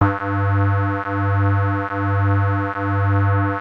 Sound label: WASP; Masterbits; Vintage Voltage; SEQ-FX